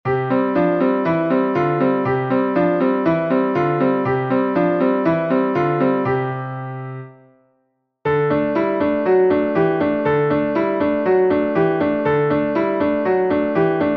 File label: Agile Hand Alt Thumbs, Piano Warm Ups